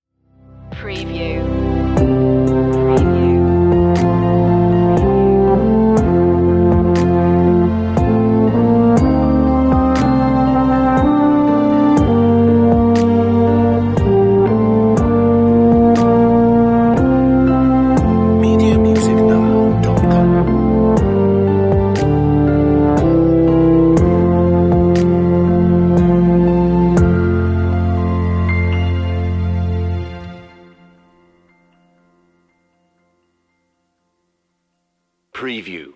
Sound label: away in a manger euphonium feature; christmas; traditional